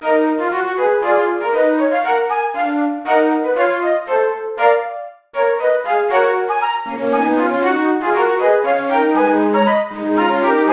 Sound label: Flute; Wayland's Watch; The Bard's Tale Remastered Trilogy